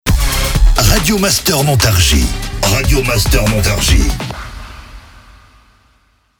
Jingle SPEED; Montargis; Radio Master Montargis

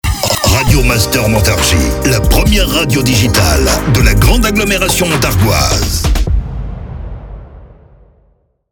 Jingle SPEED; Montargis; Radio Master Montargis

